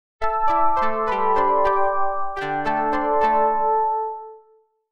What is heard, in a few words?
midi